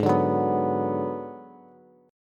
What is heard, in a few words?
Ab Minor 7th Flat 5th Ab, down, Bass; strummed; JGuitar